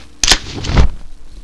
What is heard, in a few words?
UMBRELLA